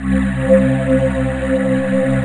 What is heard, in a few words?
AIR VOX C2, VOICE; AKAI S-Series CD-ROM Sound Library VOL-7; AIR VOICE 1